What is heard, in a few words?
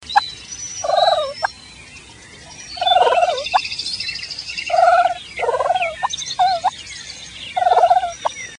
Turkey Purring